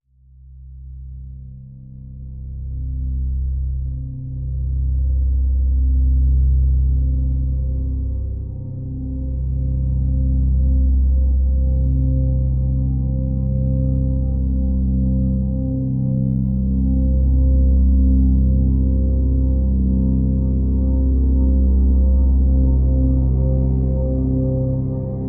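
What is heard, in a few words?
Drone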